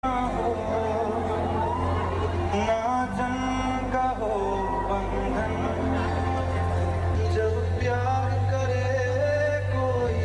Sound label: bollywood ringtone